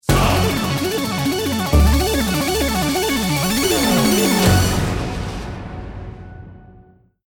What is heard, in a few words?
won round